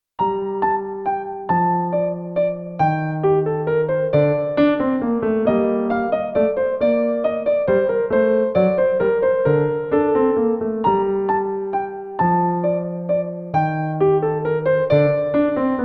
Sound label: Menuet BWV 115 Piano